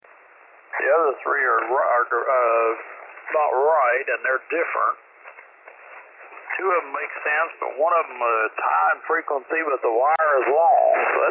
quiet vs noisy antenna

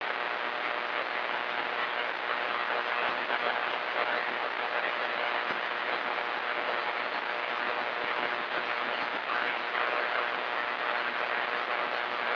hi buzz

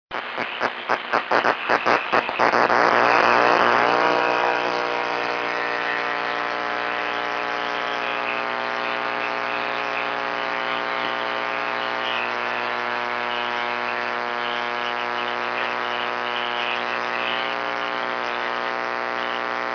6m AM; northeast

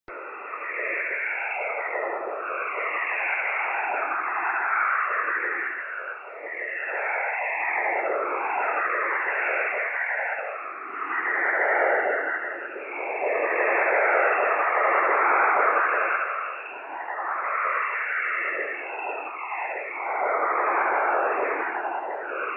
40m noise LSB

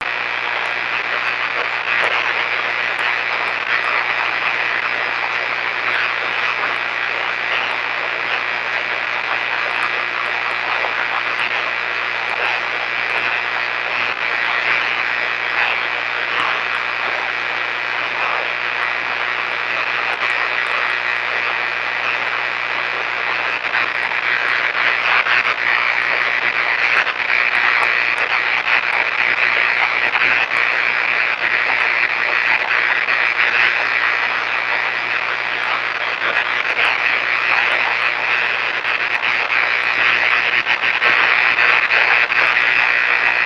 2m powerlines AM

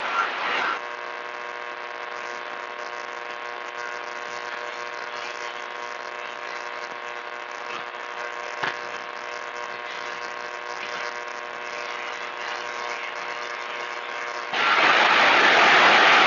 80m rfi

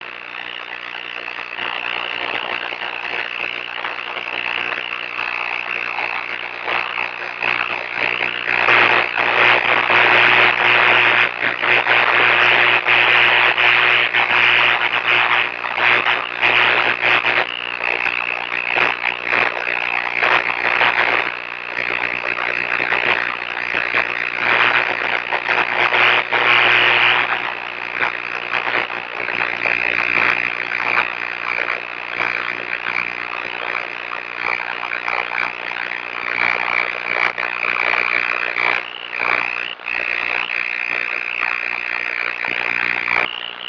noise 15m

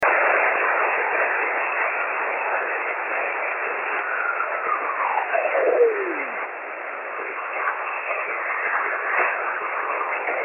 40m CW daytime

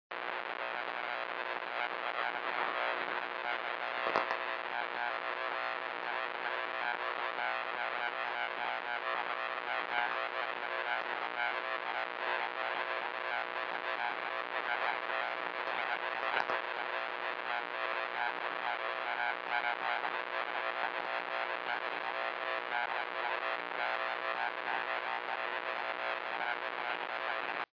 6m noise

